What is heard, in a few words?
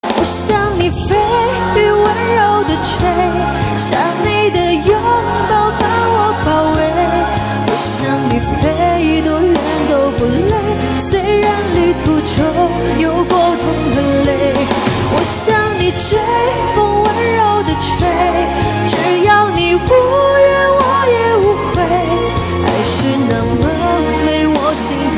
Remix